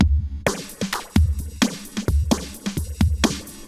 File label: Moog Phaser HR-16B (130BPM), cyborg beats